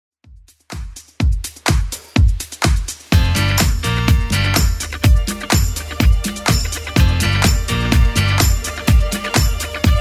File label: 80s Club Edit 125 bpm; remixplanet